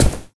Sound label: Player Footstep; those nights at rachels gamerip 2015